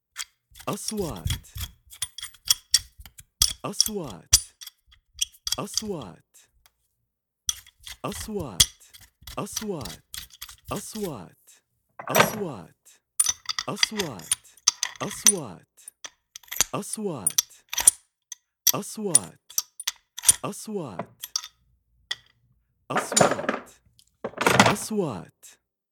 plumbing spanner wrench spanner open wheel iron metals tools; plumbers fixing metals workers